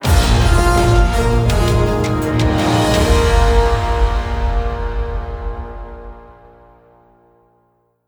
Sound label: Sting Endgame Win; Ember Strike